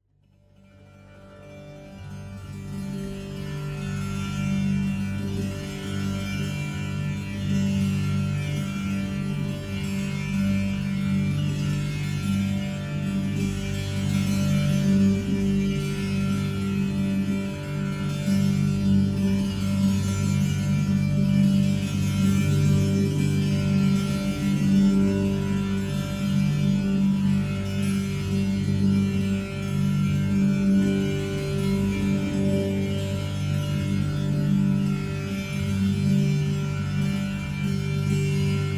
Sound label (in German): Bass-Körpertambura, Stimmung d-g-g-G (GG), stetige Spielweise; ca. 70 x 33 x 12 cm; ca. 2450 g; Körpertambura® (Body Tambura)